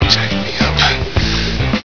bump; vortex; velvetjackson

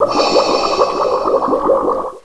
gurp; opentdm; DarthVader

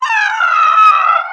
widow pain; rogue

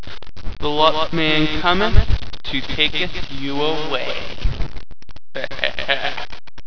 pain; Luptonium Logo

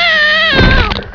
pain; kennyrim

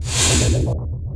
plasma vent; lmctf

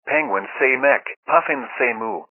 death; openffa; puffin

